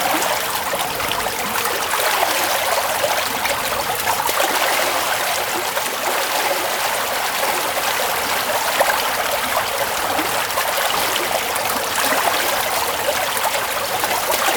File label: Town Water Fountains Loop; Warframe; Duviri; Ambience